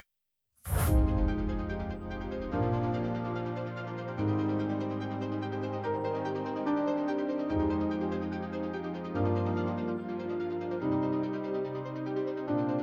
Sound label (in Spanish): instrumental